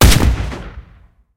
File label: cannon 25mm 72k shot; cannons; War Thunder